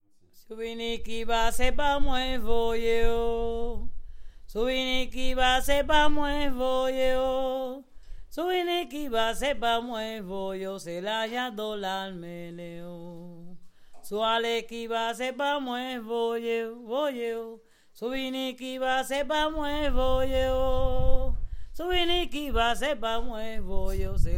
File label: merengue